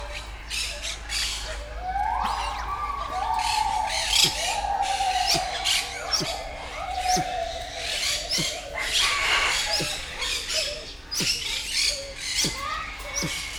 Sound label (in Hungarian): nyögdécsel, sisakos hokkó; nyiregyhazi zoo 2019